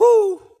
"ooh" male

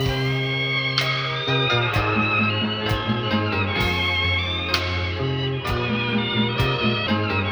strings, Sad

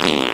fart short

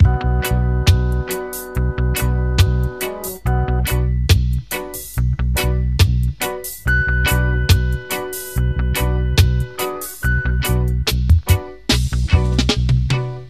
full ragga

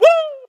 "woo", voice; ooh ahh

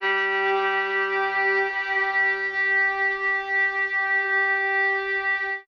String

